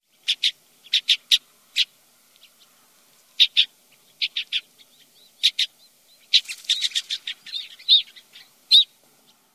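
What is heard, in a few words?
Black Rosy-Finch calls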